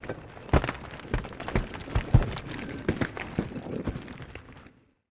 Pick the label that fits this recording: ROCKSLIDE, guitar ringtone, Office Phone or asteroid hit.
ROCKSLIDE